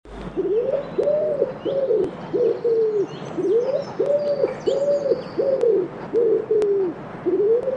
taube

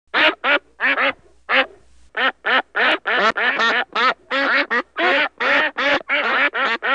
schnattern

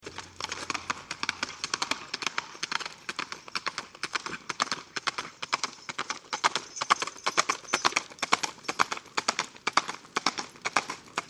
galopp

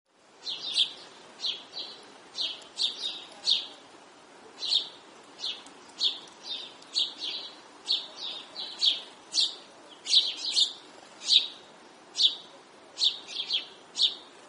Spatz

